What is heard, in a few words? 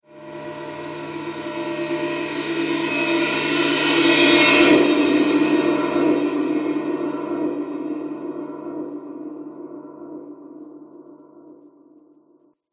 Reverse Cymbal Whoosh